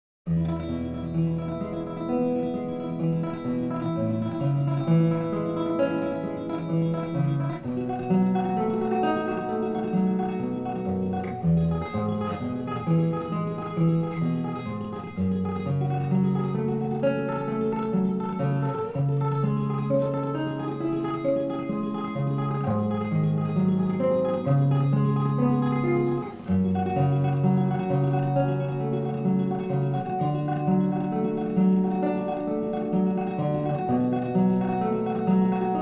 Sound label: Vocalize; Etude No.11